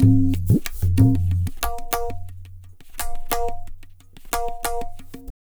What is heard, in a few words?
Pure Tabla, 090BPM; Zero-G